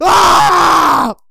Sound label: death; sven; crimson commando